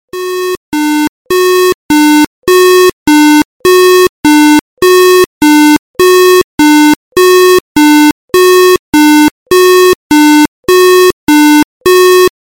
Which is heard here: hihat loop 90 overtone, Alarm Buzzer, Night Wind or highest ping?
Alarm Buzzer